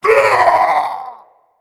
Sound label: male centaur skeleton, Death